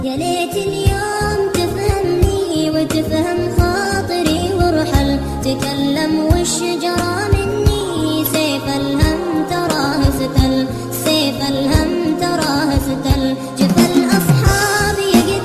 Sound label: Arabic islamic Ringtone; ringtones